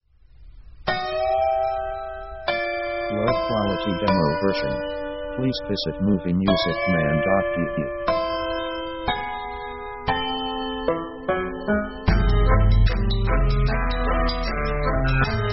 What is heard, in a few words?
gypsy blues